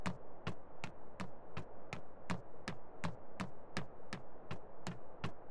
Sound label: Surface metal